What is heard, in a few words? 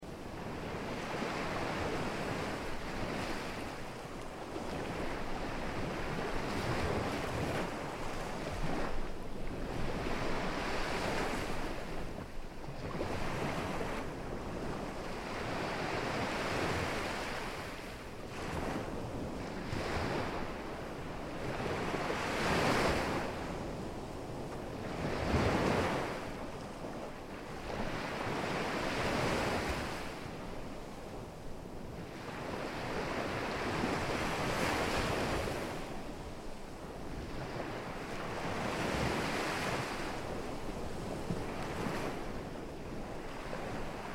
Ocean Sounds; Meditation; ambient